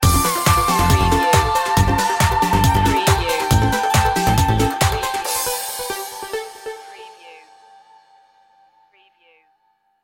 dance stinger

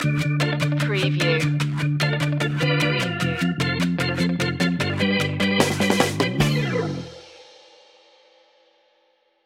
ska stinger

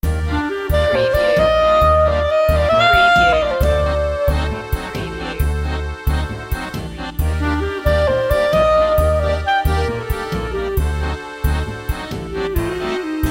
tango francaise; 30 sec

